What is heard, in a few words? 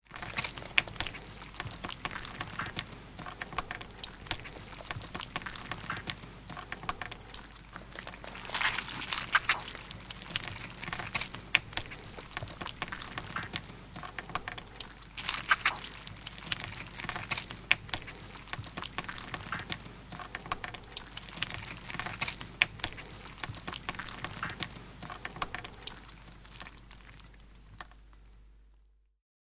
Blood Drips Squelch; gore stabs & eat